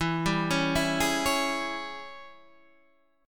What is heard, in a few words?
G sharp Major 7th Suspended 4th Sharp 5th, E